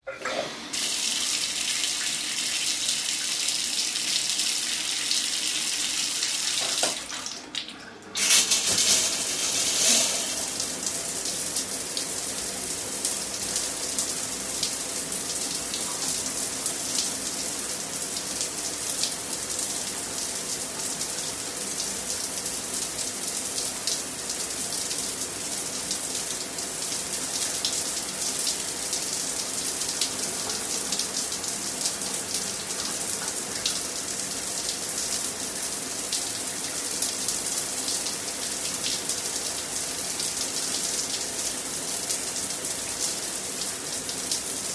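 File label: water running in the shower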